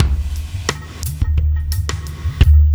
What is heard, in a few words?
Landing Party Beat, Processed Drumkits